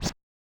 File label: Yamaha DJX 2B, Scratch R